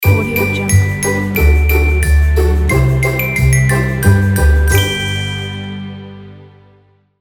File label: christmas logo